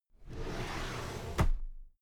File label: drawer cabinet paper open regular